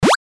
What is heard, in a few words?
pickup